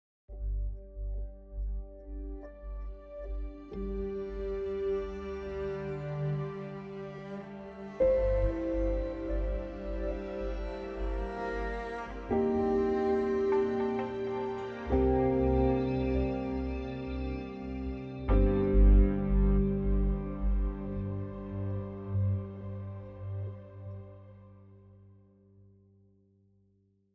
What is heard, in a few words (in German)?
Emo Akzent